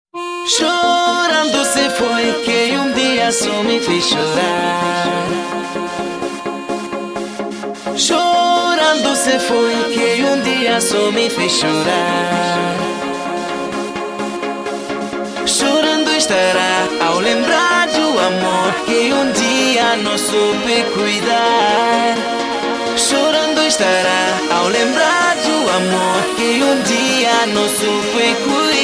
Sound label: dance